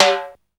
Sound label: RX5 HI TIM,RX-5; Hollywood Edge; The Big Bad Beatmachine